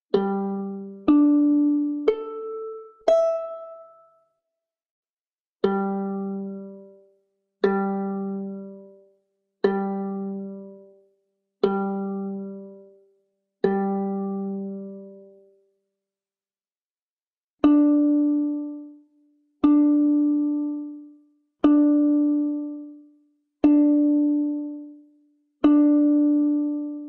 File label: Violin notes; نت های ویولن